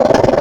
Penguin, Wavetables; Cymatics; Serum; Animals for Serum - Gold Edition

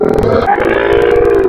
Goose, Animals Wavetables; Cymatics; Serum; Animals for Serum - Gold Edition

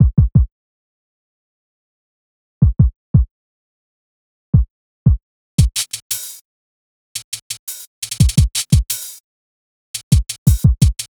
Drums, Hip Hop Exotica, 086 Emin; Apple Loops; Big Fish Audio

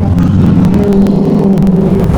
Polar Bear, Wavetables; Cymatics; Serum; Animals for Serum - Gold Edition

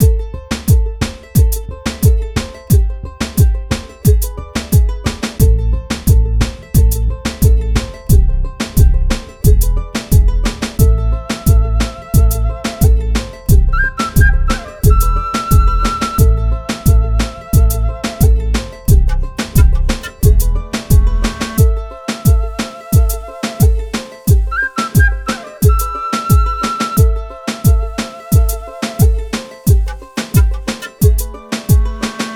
089 Amin, Hip Hop Exotica

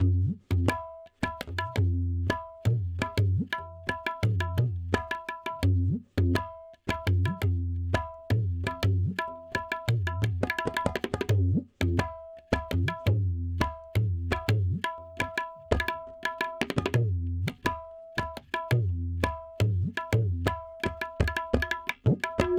tabla 085; Hip Hop India Modern Percussion

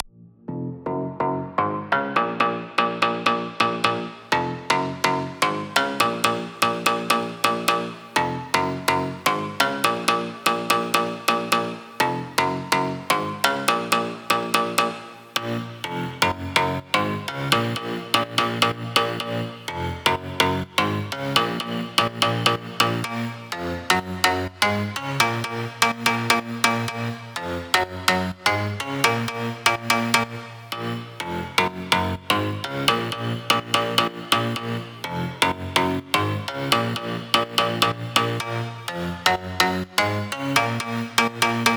Bass WET SC, Future House 125 BPM A; Big EDM; Future House Tools; Construction Kits; Clap That

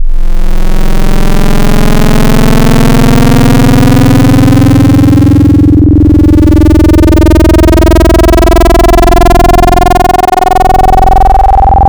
Emo Screamo, Wavetables; Cymatics; Jawz Wavetables; Serum

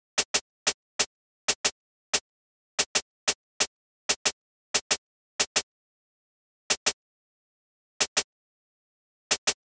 Hat, 092, Cmin, Drum Tracks

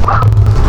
Criminal, Foley Wavetables; Cymatics; Foley Wavetables Vol 1; Serum

